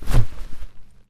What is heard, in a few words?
z swipe, Zombies; zps; Attack